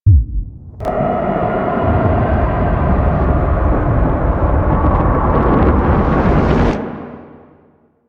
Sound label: Nuke Scene; Warframe; NineteenNinetyNine